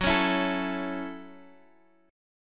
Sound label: F sharp Minor 7th, Guitar, Half Step Down